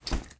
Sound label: Bag